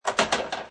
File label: Billiard Pool Snooker Ball Delivery Mechanism In Table